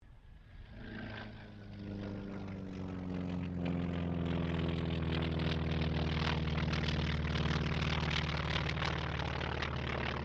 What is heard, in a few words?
AIRCRAFT T-28D TROJAN PASS; Distant; Airplanes; Vehicles